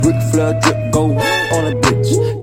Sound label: drip; goku flair